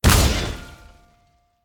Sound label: hit; smite; arthur skin9